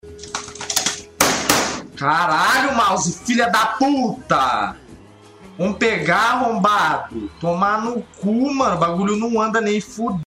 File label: mouse